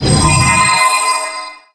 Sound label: hypergem creation; match3